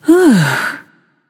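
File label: nonverbal sigh; smite; voicepack; izanami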